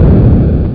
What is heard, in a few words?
bang Large